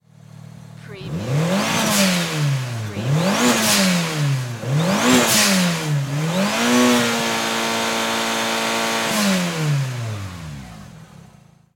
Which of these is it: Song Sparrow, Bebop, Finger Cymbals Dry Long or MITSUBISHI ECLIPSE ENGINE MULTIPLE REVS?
MITSUBISHI ECLIPSE ENGINE MULTIPLE REVS